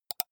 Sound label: computer mouse left click single fast